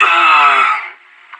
death; player vo anarchist; Counter-Strike Global Offensive

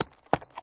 horse walk; Mount&Blade Warband

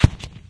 city battle, streetwar; ambient, levels

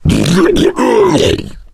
male boomer shoved; voice; Left 4 Dead 2

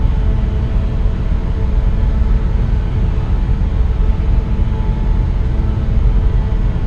duct works; Duke Nukem Forever

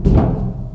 duct, footsteps survivor walk; Left 4 Dead 2

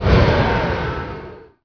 carryall land; Emperor - Battle for Dune

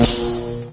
stunstick impact; weapons; Garry's Mod; hl2

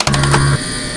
crane magnet switch on; vehicles; Garry's Mod; hl2

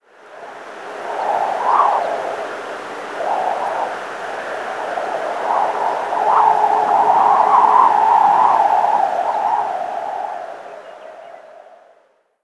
thin wind gust, ambient; Left 4 Dead 2; random amb sounds

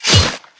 slicer, machines; Garry's Mod; hl2

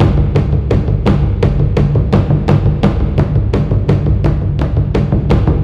horde, music

